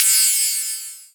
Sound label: Ride, Cymbals; Vengeance Samples